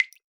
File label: Drip; Drips